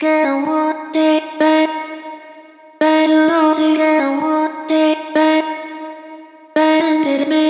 Vocal Loop C#m 128BPM; Vocals; GhostHack; Ghosthack - Advent Calendar 2022 - Day 12 - Vocal Loops

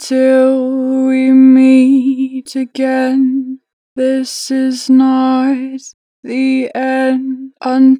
Not The End, 4 bar vib, Vocals, Natural Vibrato; Harmor Vocals

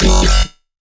Bass F# Cyborg, Dubstep; Bass One Shots; Cyborg1; Ghosthack; Dubstep Sound Arsenal; 50 Bass One Shots

